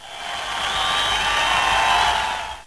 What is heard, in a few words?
End of game